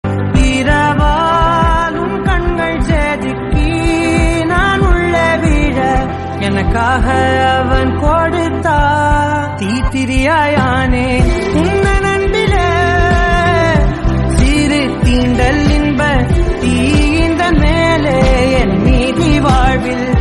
Tamil Song

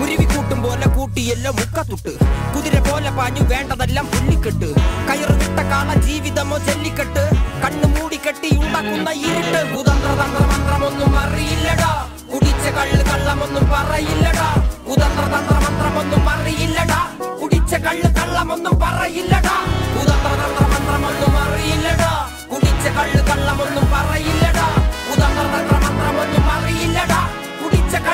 Malayalam Ringtone; Manjummel Boys

